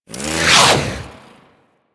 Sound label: Kusarigama Plasma Whoosh; Grn Kusarigama